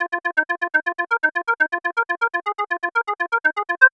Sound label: Keyboard